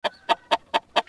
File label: chicken idle; tf2pets; csgochicken